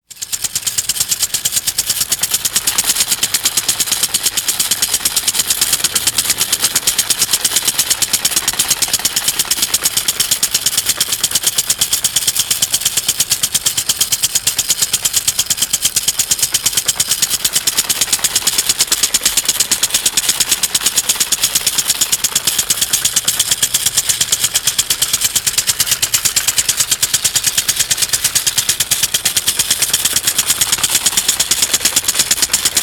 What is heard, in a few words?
household sprinkler watering grass; sound effects one